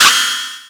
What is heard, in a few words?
Spin Attack Clang; Final sword "clang" of the spin-attack; Link